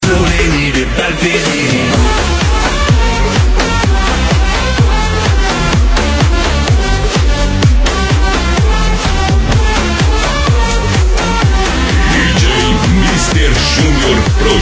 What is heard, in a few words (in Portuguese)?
Batida Dance